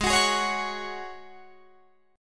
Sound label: G sharp 7th Suspended 4th G sharp, Guitar Drop D